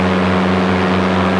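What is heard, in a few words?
Beech Baron 58 engine, General Aviation; X-System 4.65; planes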